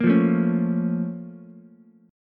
A sharp Minor Major 7th, down, Ukulele; A#mM7 strummed; JGuitar

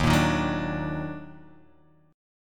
Eb Minor Major 7th Eb down, chord; EbmM7; strummed; JGuitar

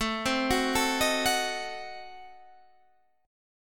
A Diminished 7th A, Guitar Standard; Adim7 arpeggiated; 17,15,16,14,16,14; JGuitar

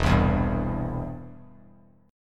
E Minor Sharp 5th E down; Em#5 strummed; JGuitar

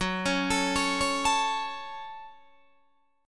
Gb Minor, Guitar, DADGAD; Gbm arpeggiated; 16,16,19,18,16,19

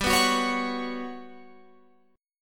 G Major Sharp 11th G down Guitar Open F; Listen to GM#11 strummed; GM#11 Chord

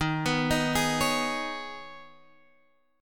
D sharp 7th Suspended 4th Sharp 5th; x,x,13,16,15,15,15,x

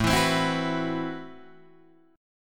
A Minor 6th Add 9th A Guitar DADGAD

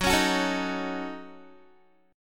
F sharp Minor 6th F sharp Guitar; F#m6 strummed; 14,16,16,14,15,x

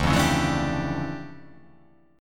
D Minor Major 11th, down; DmM11; strummed

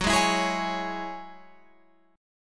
Gb 7th Suspended 2nd Gb down Guitar Open F; Gb7sus2; strummed

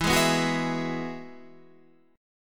E Major Add 9th, Guitar Standard; strummed; JGuitar

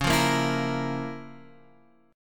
F Major 7th Suspended 4th Sharp 5th Db

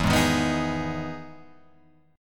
E Major 7th E down Guitar Open F; EM7 strummed; JGuitar

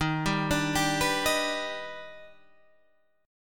D sharp Augmented Major 7th, Guitar, Open G; 13,12,12,12,12,13

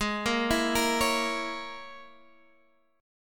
C sharp Minor 6th G sharp

